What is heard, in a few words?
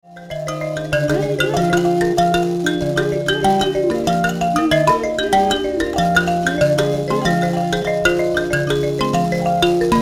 mbira